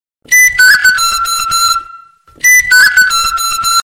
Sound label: Flute Sms